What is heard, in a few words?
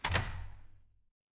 door open